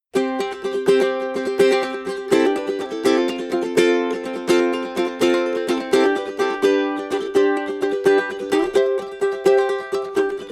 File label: ukulele